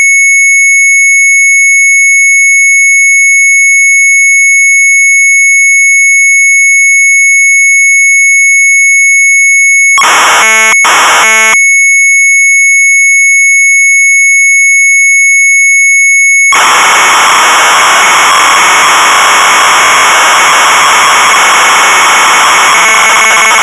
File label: MZ800 Tape; Apollo2.; sharp; retromelsarchive